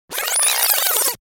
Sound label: rewind